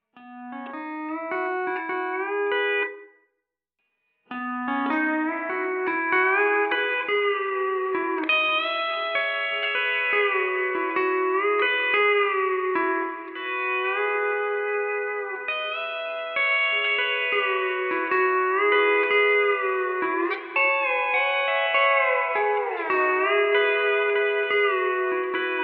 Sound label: DIY Reverb; Strymon TimeLine MX